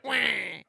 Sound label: wahh